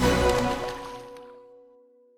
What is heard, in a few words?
fishing well done